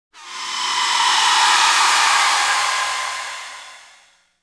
screeches

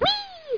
WEEE

